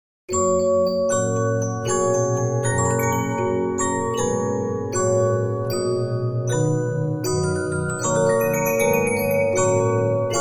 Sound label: Regina, 15inch disc musicbox